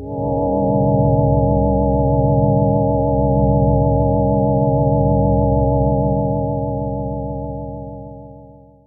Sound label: PAD